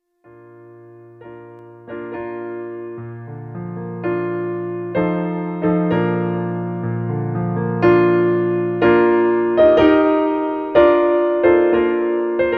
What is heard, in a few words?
wagner piano